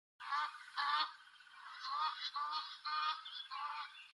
Addax; تابیده شاخ

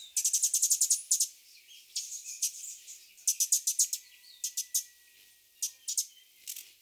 Cuban emerald

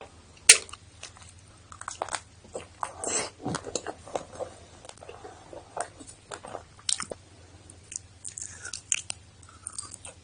fruit roll

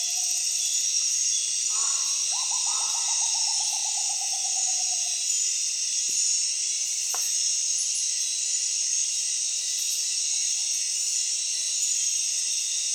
Rufous potoo

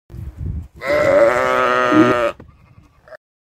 Bighorn sheep; قوچ میش کوهی

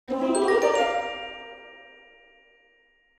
game bonus

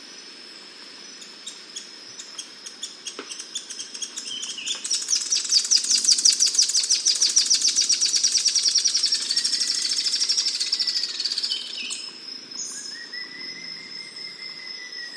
Pink legged graveteiro; پرنده گورمرغ پا صورتی

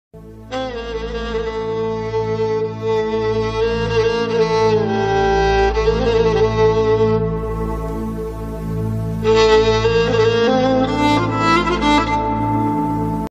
Fiddle

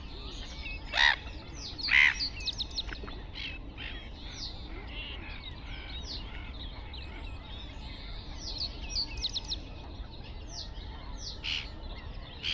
Black headed gull; پرنده کاکایی سرسیاه کوچک

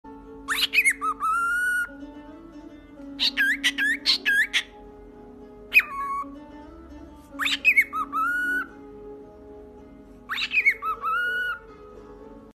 Cockatiel; عروس هلندی

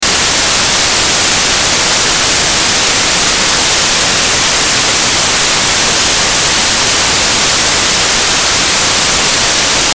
white noise

